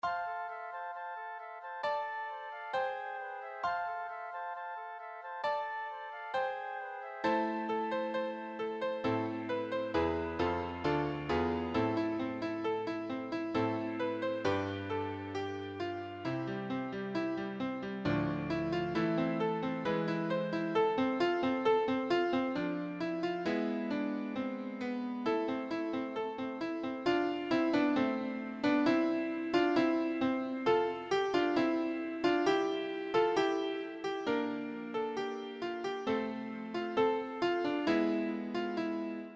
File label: PIANO